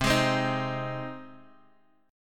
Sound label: C Minor Sharp 5th, Guitar, Drop D; down; chord shape